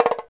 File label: Tamborim Roll, Percussion; Roland BK-5